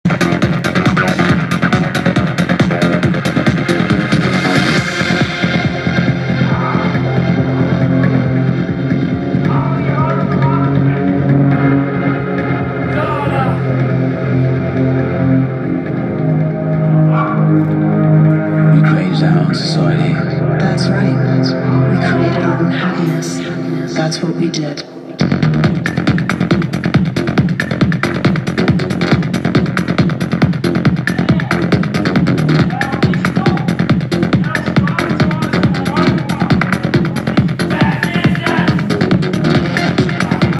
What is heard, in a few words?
Techno Rave